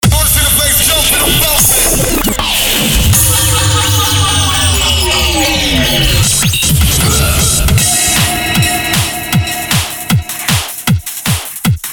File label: Party Hard Bass